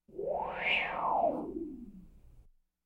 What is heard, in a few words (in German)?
Frequenzton auf und ab; Science Fiction